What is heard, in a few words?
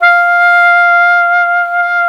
SAX F3, SAXOPHONE; USB Soundscan vol.63; Balkanish Traditions; AKAI